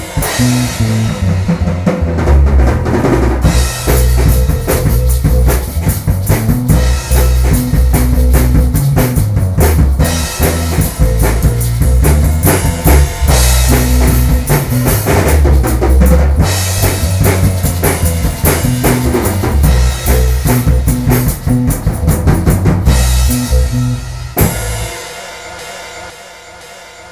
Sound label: groove interlude